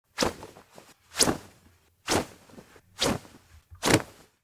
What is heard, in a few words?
Towel Whoosh; Xayah; Foley